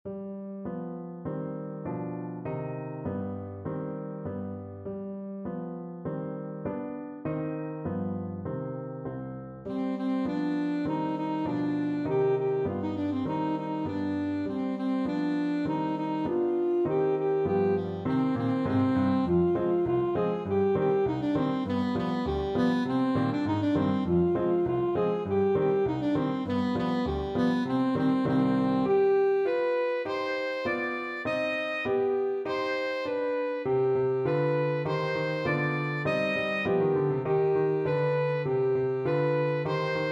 ASAX, saxophone

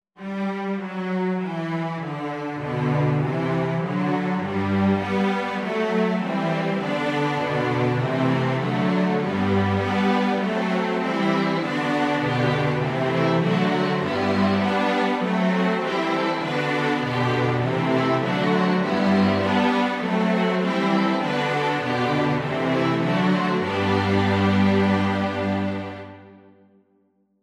canon in g major, flexible mixed ensemble

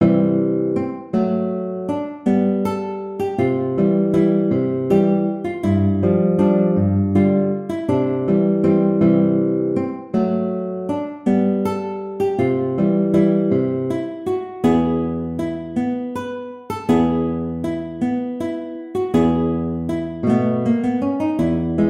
Waltz, guitar

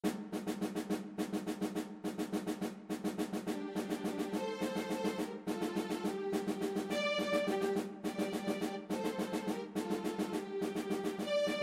STRINGS, string quartet; Lhomme arme

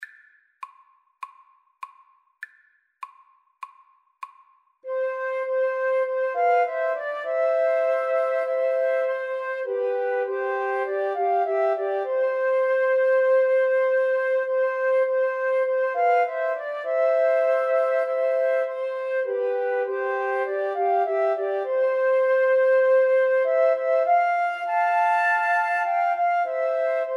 savior like a shepherd, Flute Trio

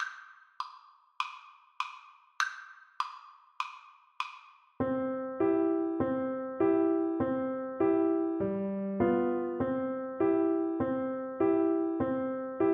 yankee doodle, Flute

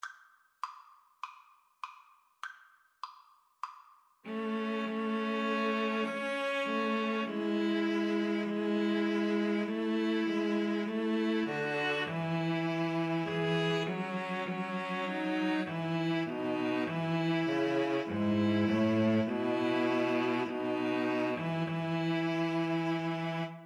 String trio

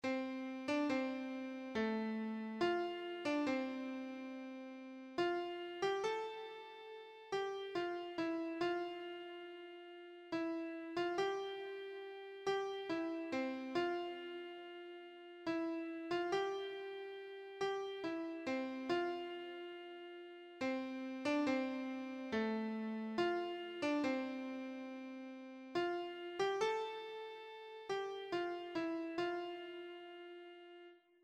rock of ages, keyboard

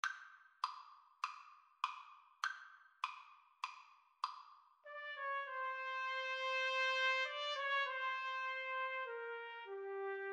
trumpet duet

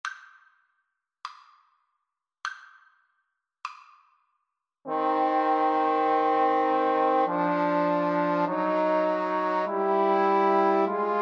aequale, Trombone Trio

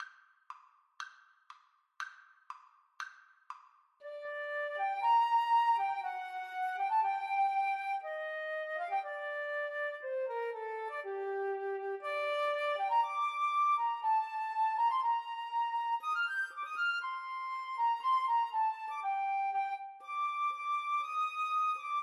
flute duet

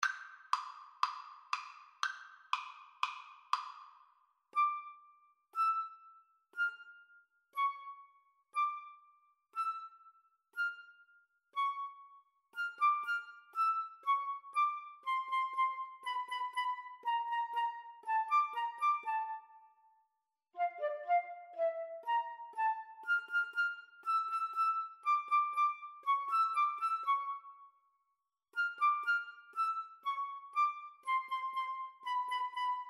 Flute duet

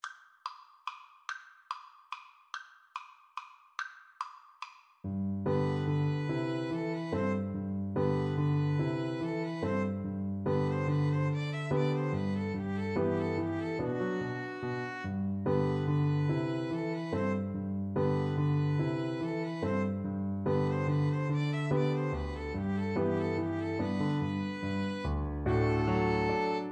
Violin Viola Duet

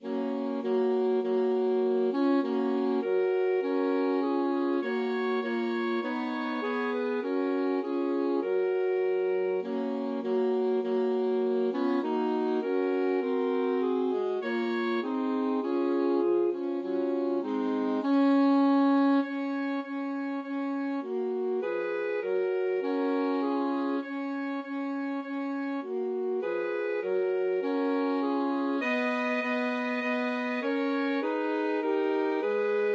alto sax trio

